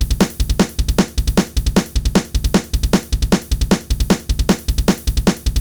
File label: Drums; deadfoot drum training